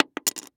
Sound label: Tongue Depressor; Blastwave